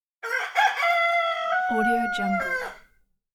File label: rooster